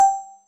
ui confirm

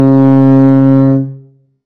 vehicle ship, sfx

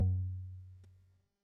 wrong

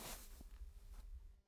build green roof

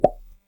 choice wrong

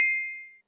hit ok

